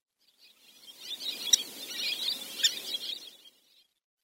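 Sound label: Common Tern; ajisashi; yanagawa; sakata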